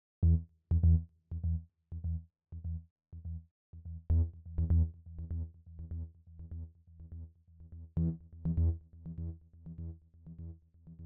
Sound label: bassline